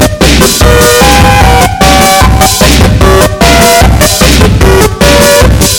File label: ear rape